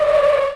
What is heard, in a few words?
Slow Skid; PC Gamer IT CD 49; UrbanChaos